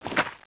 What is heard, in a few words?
draw; bouncer; weapons